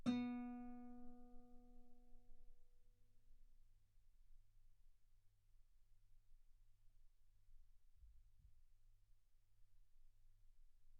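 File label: Guitar ff B string B3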